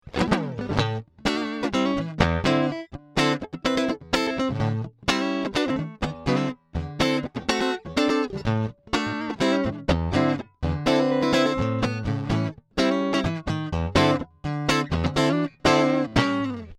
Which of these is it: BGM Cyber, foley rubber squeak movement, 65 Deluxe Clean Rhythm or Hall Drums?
65 Deluxe Clean Rhythm